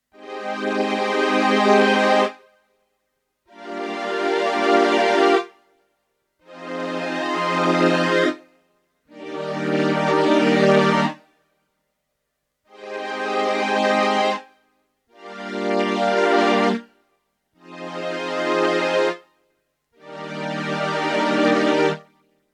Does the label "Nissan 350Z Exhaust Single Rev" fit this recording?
No